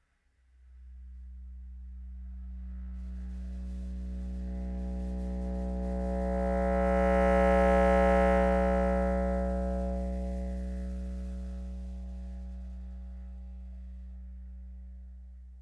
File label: Tin foil C1